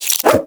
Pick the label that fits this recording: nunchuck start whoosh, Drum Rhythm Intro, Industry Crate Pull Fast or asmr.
nunchuck start whoosh